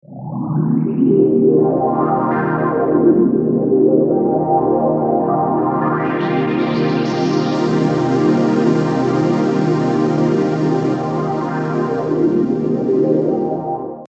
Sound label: simple pad resonator, Atmospherics